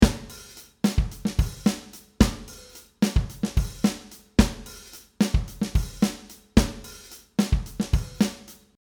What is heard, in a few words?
funk 110bpm